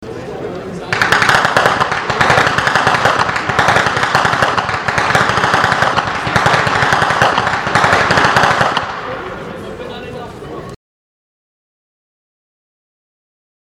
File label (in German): schnalzen; steindorf